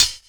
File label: Hhat, Hihat; Equipped Music; Loungin House